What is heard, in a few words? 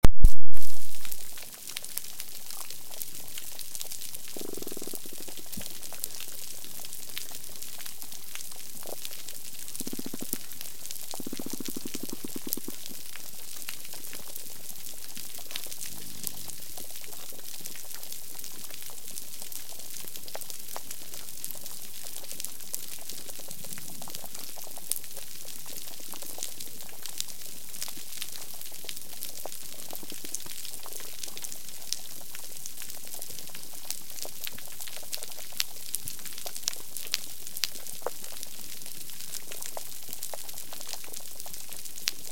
Reef, Soundscapes of Coral Reefs